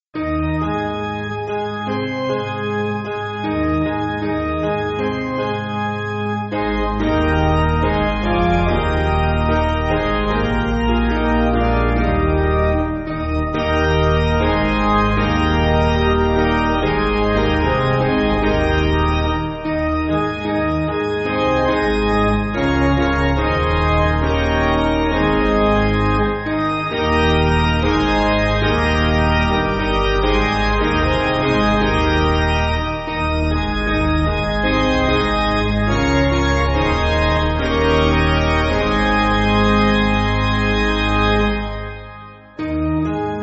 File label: Let Us Sing Songs, piano